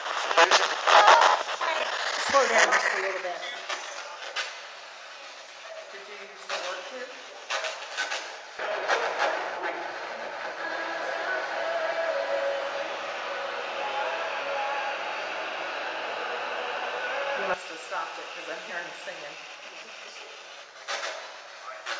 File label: singing